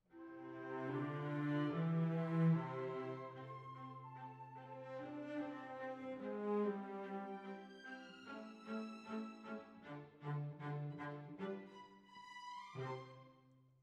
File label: Cellos